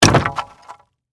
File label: Impact Wood Hit, Sledgehammer, Debris; Hammers (Sound Effects:Tools); BlastWave